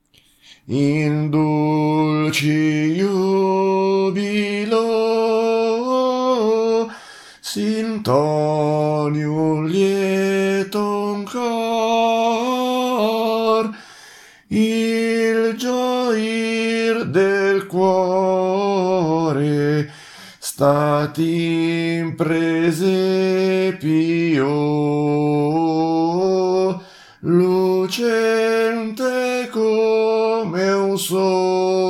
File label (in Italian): soprani; In dulci jubilo